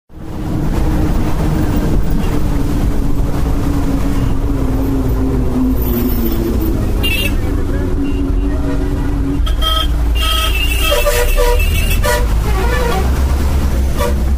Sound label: ringroad blues